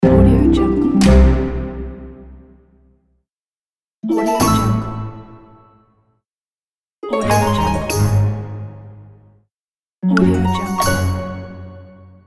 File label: orchestral cartoon accents